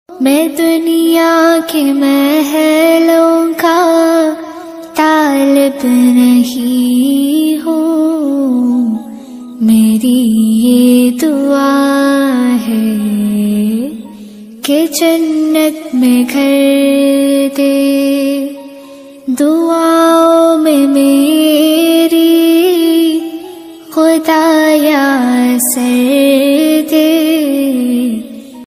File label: slow version ringtone